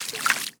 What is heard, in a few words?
run water stop